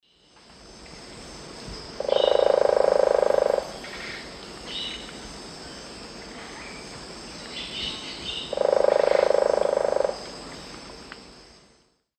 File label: Celeus spectabilis, drum; S. America; Eastern Lowlands